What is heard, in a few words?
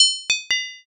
metal drop